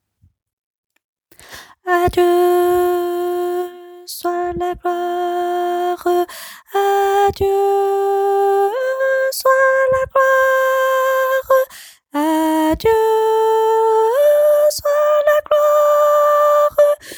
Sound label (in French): ténor